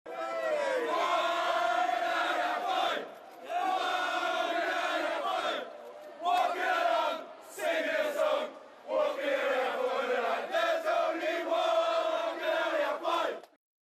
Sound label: Olympians being welcomed home at Heathrow airport